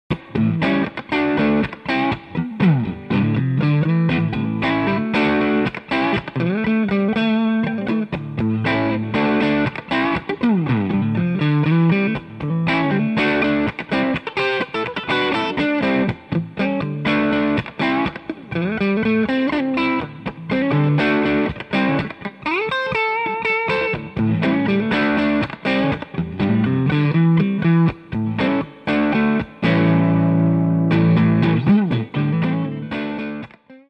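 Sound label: crunch drive; st-555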